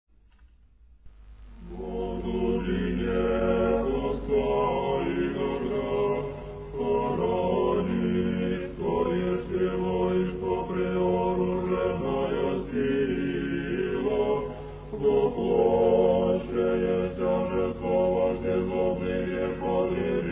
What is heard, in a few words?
irmos; octoechos